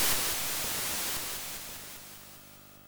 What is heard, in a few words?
Attack Alien Gun Turrets; Parrish Chris